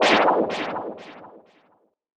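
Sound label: deep house FX, 125 bpm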